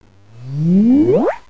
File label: ZIP; Bible Fun Pack